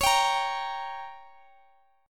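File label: G Diminished, D flat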